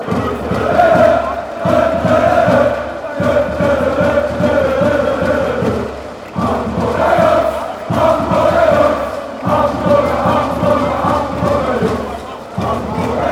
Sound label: hamburger jungs fanchants